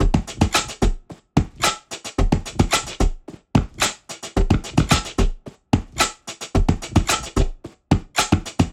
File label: Foley Loop 110BPM, Drums; Antidote Advent